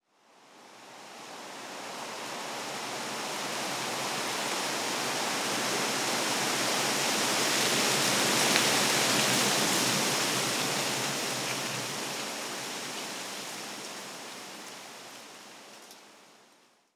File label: wind tree; ambient; nature